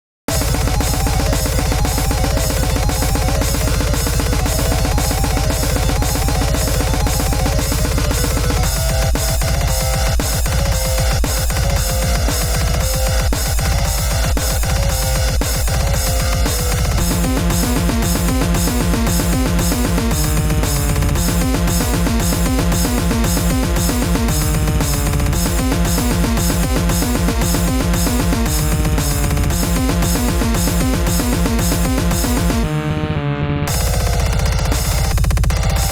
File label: Instrumental